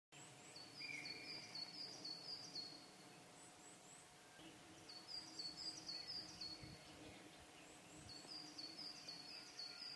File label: sijyuukara, bird voice